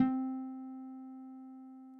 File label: Instrument